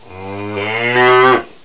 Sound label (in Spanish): vaca